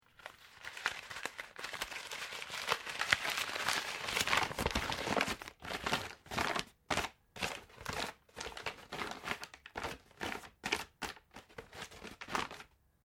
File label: PAPER UNSCREW; Material components for constructing more complex sounds; Sound Effects:Materials; Foundation3